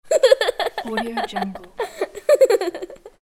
kid girl giggling; fxprosound

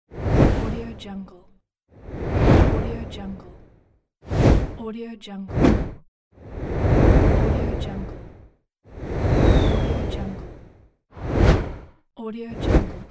whoosh

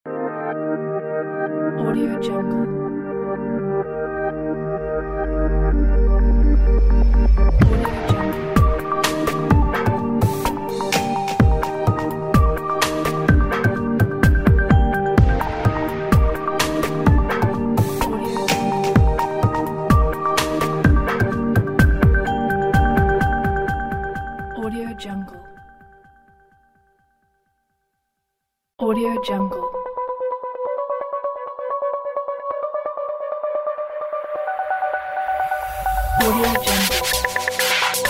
high tech logos pack

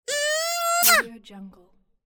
cartoon big kiss

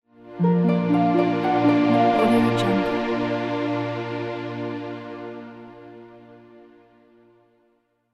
mystery secret

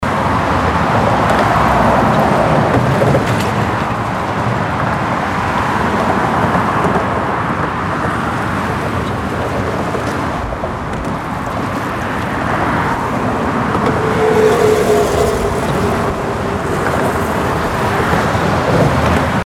traffic on a bridge; audiomechanica2

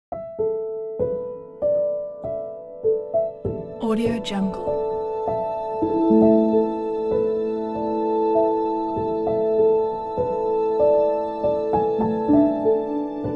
beautiful orchestra theme

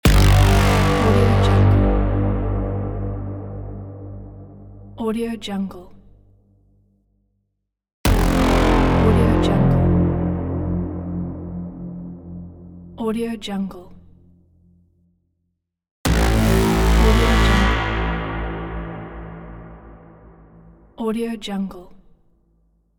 horn stab

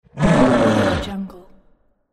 game character creature voice demon scream

